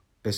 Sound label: Write; Escribir